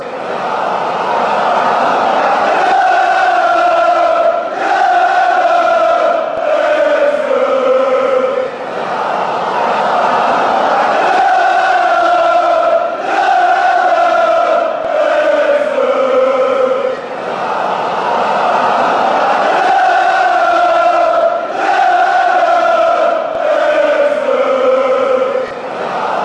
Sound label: fanchants; antwerp